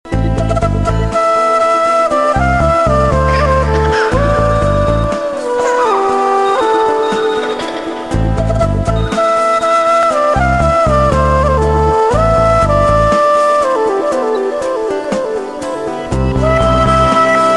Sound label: theme classical; bsnl